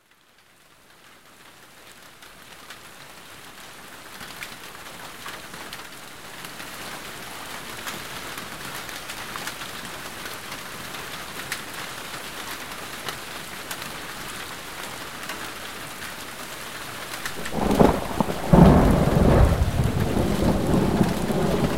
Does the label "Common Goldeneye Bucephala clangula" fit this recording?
No